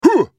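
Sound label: spellcast effort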